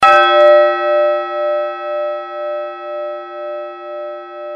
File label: Large Bell